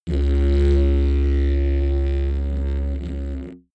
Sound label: didgeridoo short tone